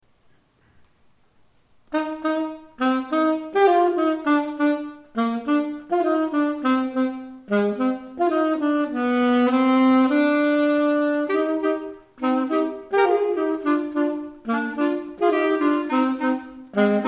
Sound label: Duet Live